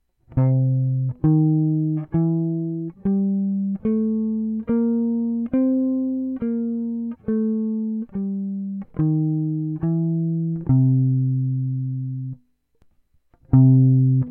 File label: Cool tones in C 1 octave